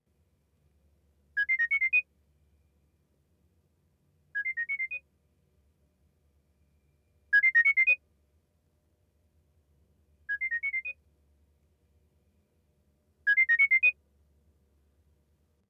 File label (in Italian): suoneria, olofonia